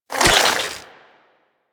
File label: Gas Arrow Tether Attach, Weapons; Warframe; Infested; Derelict Cernos Prime